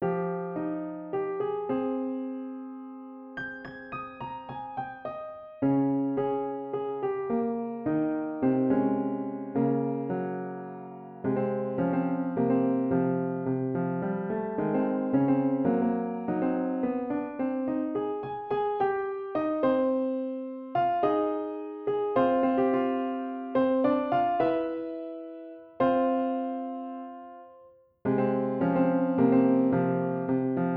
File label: Piano Music